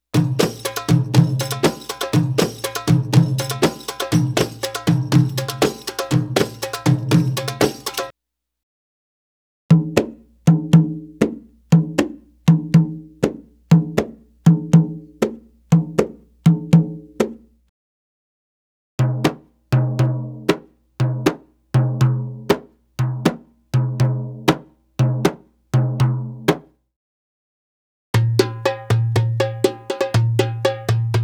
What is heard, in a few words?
Arabic Rhythms; Hadeeth - Arabic Rhythms Sample CD